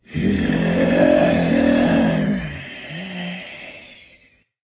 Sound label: Grunt; Glitter